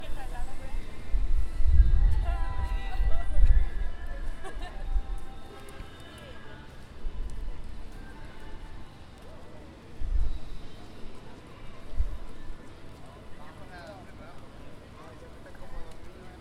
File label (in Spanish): musica calle